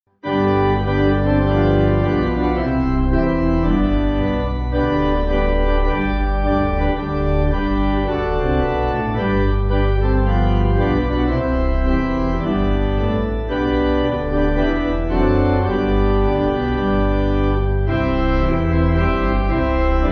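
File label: Pipe, pipe organ; Down At The Cross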